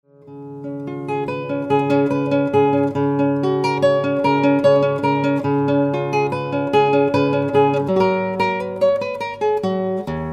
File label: MINUET